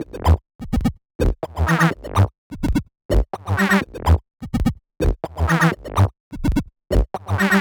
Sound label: 126 E G BASS, Funky Underground bass loops; Loopmasters; Funky Underground Vol 2